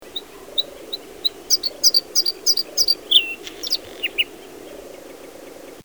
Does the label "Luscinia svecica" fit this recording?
Yes